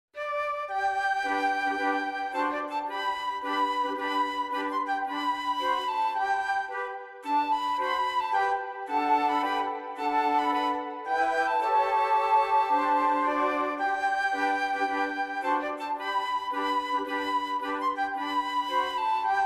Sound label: Flute Quartet; Grand March from Aida; Verdi's Grand March from 'Aida', in an abridged arrangement for flute quartet.